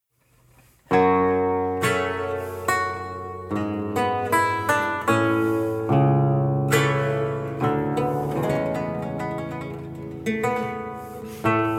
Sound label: Sarabande